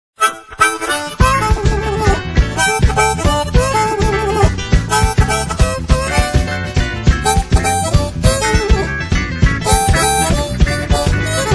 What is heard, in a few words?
harmonica mambo